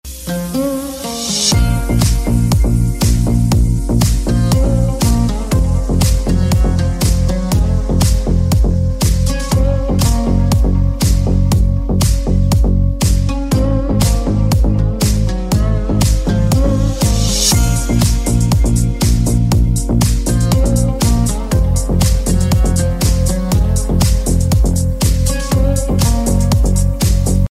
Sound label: English Ringtone